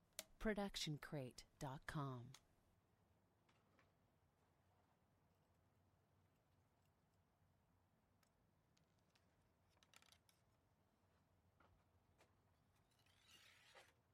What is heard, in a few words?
archery foley load pullback fire